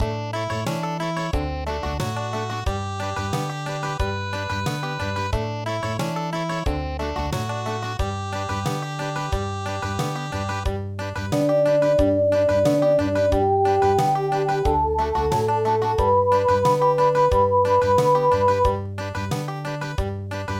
Country, midi; ALIKA

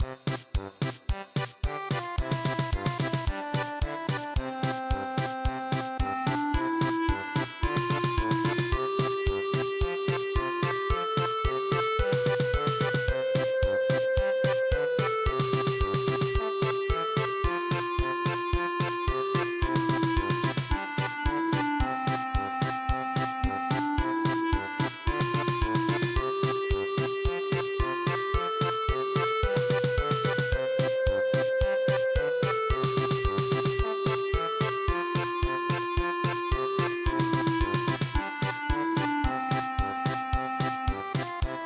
German Polka, traditional

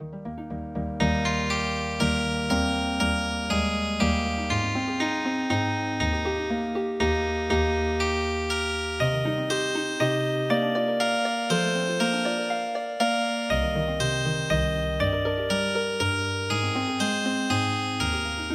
Healing Harp

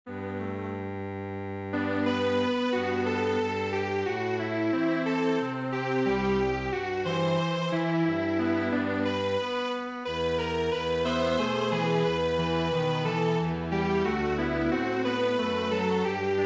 Strings Quartet

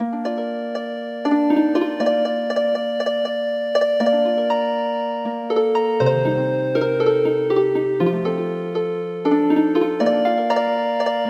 Healin Harp

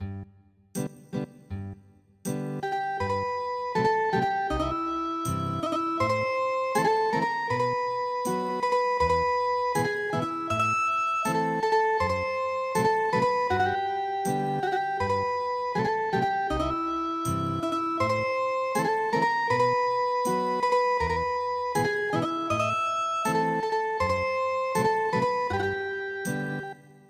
Ethnic Guitar

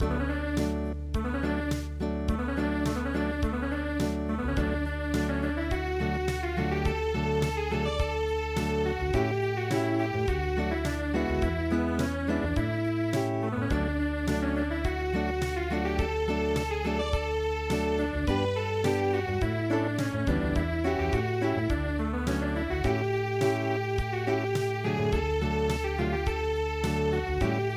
Unplugged

